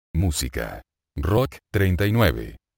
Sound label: rock